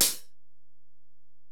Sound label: HiHat, Alesis DM-PRO